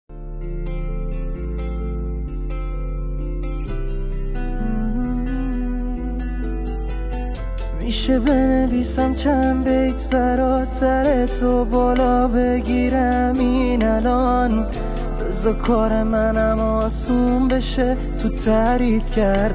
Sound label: Beat